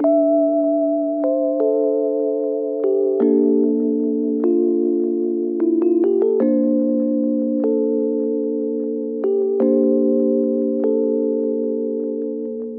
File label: Lofi Melody - 75 BPM D Min